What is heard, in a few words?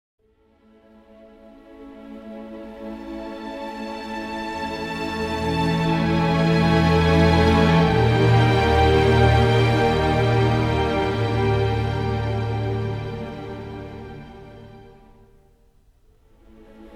ORCHESTRAL STRINGS; First Lights; LUX ORCHESTRAL STRINGS; sonuscore